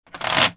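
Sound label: percussion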